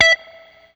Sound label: BLU POP, Guitar & Bass